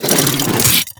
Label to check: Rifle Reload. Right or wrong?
Right